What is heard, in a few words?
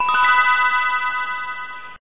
GAME START